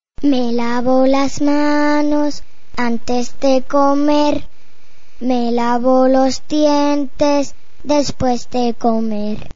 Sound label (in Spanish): cancion lavo manos antes comer